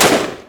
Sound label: tavor fire